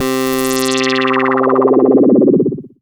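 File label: synth OBX-A filter C4; Best Service Gigapack 1; SYN ANA2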